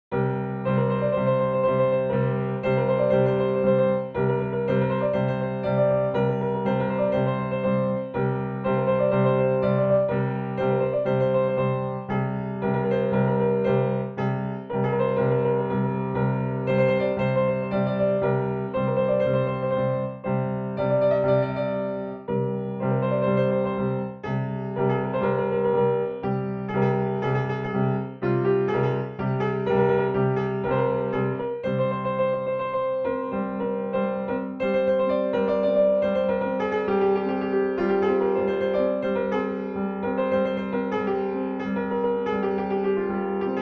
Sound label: Zang-e Shotor (Segah), piano; Radif Mahjoubi